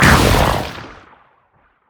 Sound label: stun; LoL; velkoz